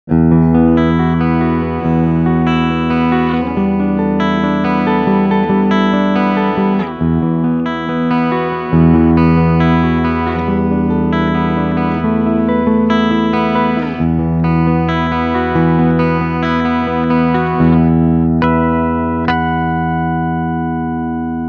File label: Clean